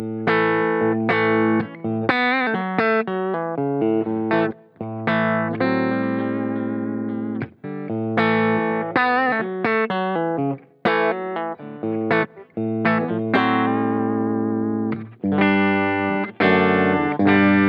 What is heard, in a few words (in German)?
Steg Humbucker Clean; Schecter C-1 Exotic